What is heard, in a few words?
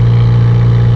ENGINE; Carmageddon Demo